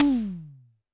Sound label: TD-20 Tom B, Roland TD-20 Toms